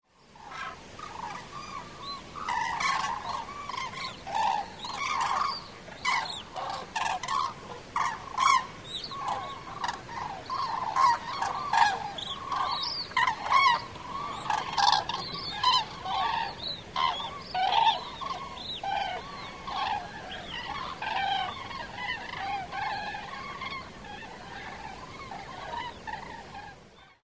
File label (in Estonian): sookurg parves; autumn